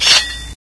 knife; MapleShrine; mkxp; gameasync

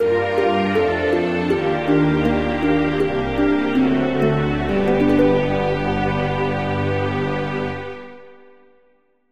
Defeat; MapleShrine; mkxp; gameasync

